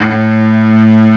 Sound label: CELLO; CMI Sound Library Rev 1.3